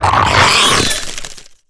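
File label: kinrath attack; Star Wars Knights Of The Old Republic I; XBOX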